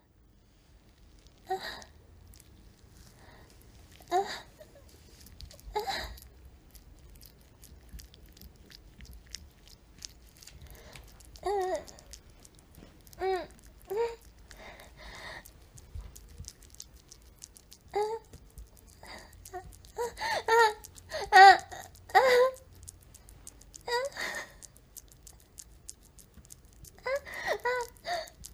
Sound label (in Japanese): 思春期美少女のマンコのヌチャヌチャ音と喘ぎ声